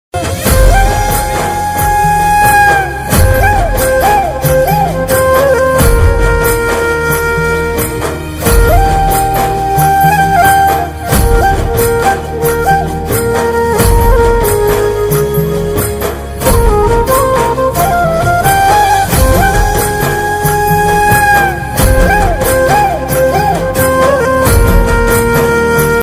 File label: Krishna flute ringtone Krishna instrumental ringtone Radha Krishna flute instrumental ring